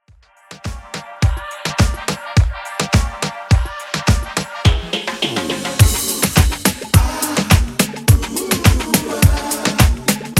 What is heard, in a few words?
90s Redrum 105bpm